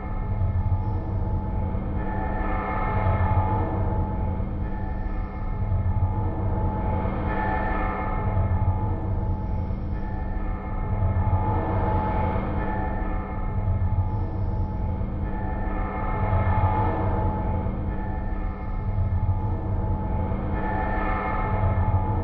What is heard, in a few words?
industrial abstract loop; Audio Game maker Sound Library; worldsounds